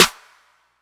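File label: clap snare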